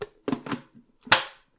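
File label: close electric kettle, 電気ポット閉める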